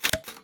nuke cover close; World Of Goo

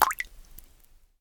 drip, water; World Of Goo

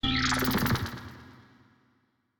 magic; CrossCode

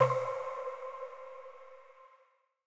rank timeout; CrossCode; battle

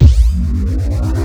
charge short; CrossCode; battle

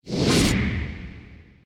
big swipe; CrossCode; battle

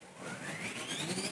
hedgehog spin in; battle

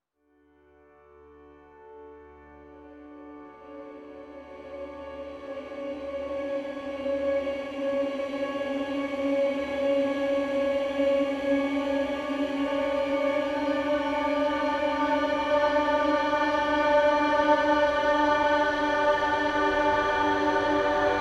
event lift; The Swapper

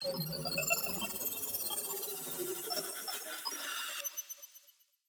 teleport

